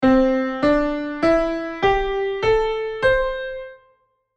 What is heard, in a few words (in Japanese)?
Jazz ペンタトニック; Jazz講座用DEMO